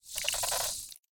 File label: scorpion insect click; smite; npc